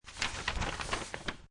Paper Movement, Handle paper, moving paper, paper slide.; Classroom (Sound Effects:Schools)